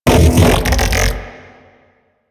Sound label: Mech Ambient Vocal